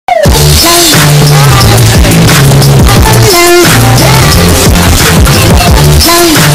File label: intro earrape